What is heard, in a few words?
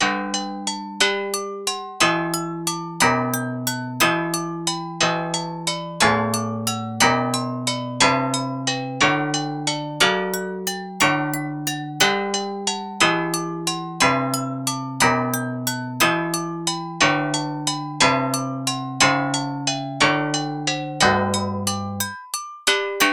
agogo